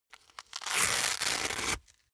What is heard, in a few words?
boxing gloves open velcro; sport freizeit; boxen